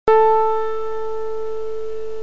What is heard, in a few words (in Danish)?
Klaver